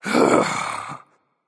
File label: sigh, voicepack; smite; jorm